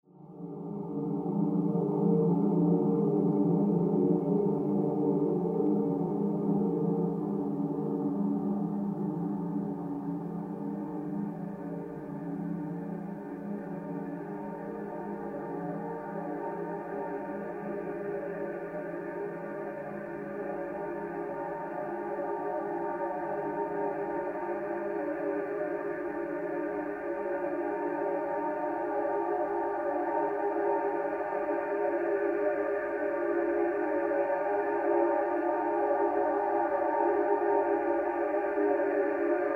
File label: Scientific Sounds Music for Inner Growth Meditation